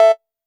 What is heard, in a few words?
TR808 Cowbell, Roland SH-32 Drumset